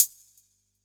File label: Elec Cl Hat, drum